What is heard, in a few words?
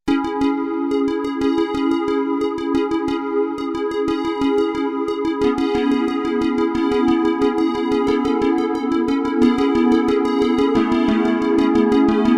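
Inverse square wave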